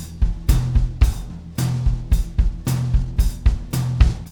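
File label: beat, Room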